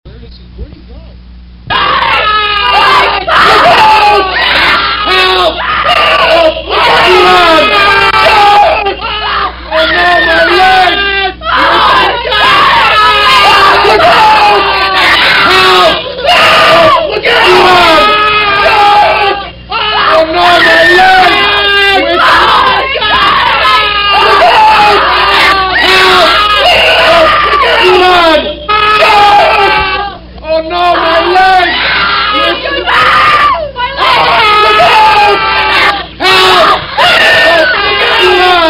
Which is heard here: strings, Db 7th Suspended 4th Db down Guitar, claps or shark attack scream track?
shark attack scream track